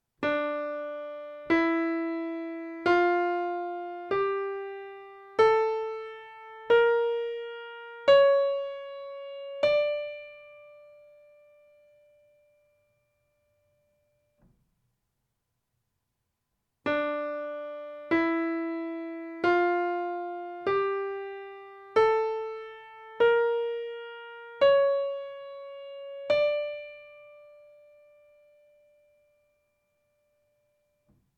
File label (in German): Tonleitern hoeren